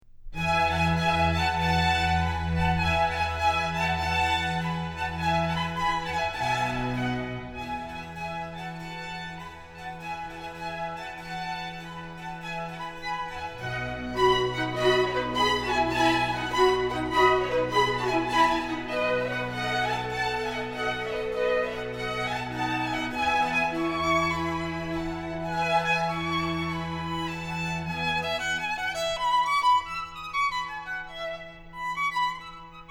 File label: Violin Concerto In E; Vivaldi